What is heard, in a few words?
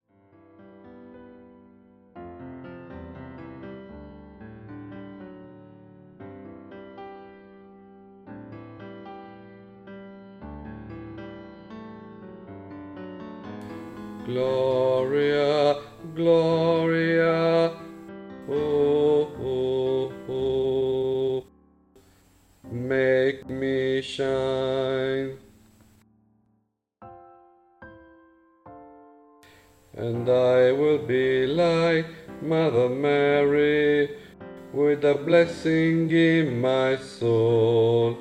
christmas lullaby bas